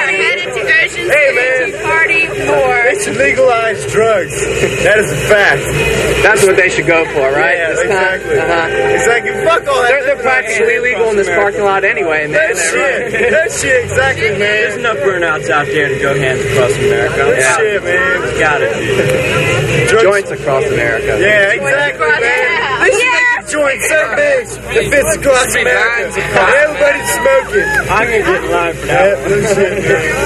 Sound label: Heavy Metal